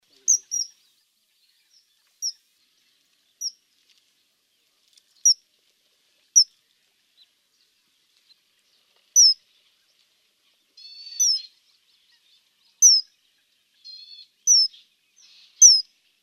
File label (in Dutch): ijsvogel